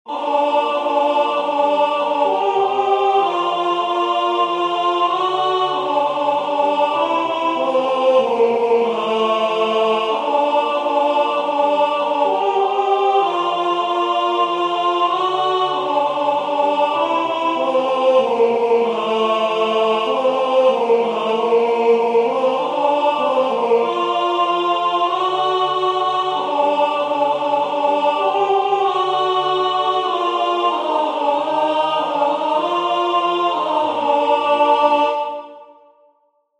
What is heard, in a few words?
tenor